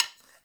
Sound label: Hunter Bow Reload Arrow Knock, bows; Weapons; Enemy